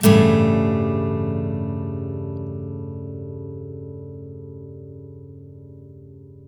BARI CHORDS, Blues Guitar; ILIO; Fretworks

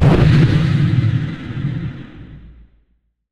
Percussive Hit, contact mic

